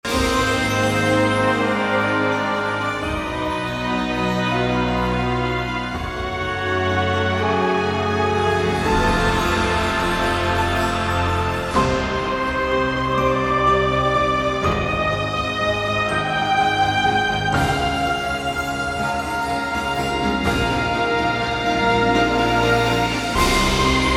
Magic, 124BPM; mixology vol 1

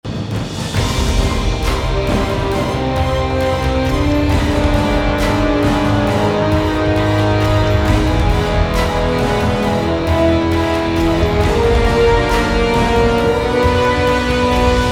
Action 135BPM; mixology vol 1

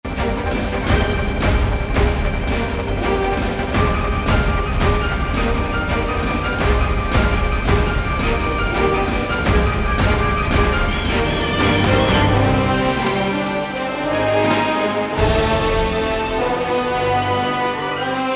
Action 158BPM; mixology vol 1

